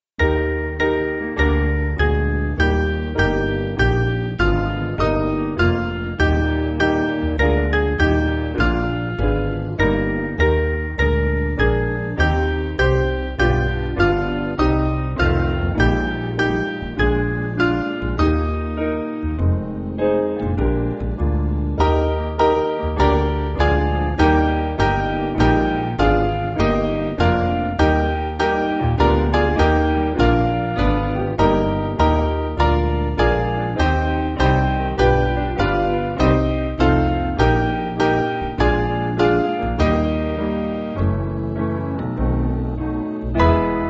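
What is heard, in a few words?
Piano, Backing Files for Churches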